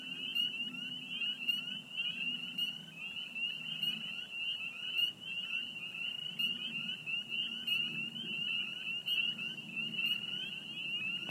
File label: Peepers peaking